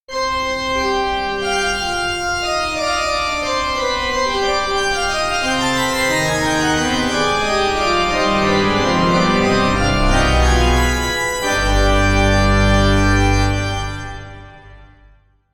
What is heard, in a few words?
organ; shimesu hibiki; RPG